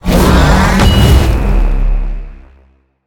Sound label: activate; smite; cerberus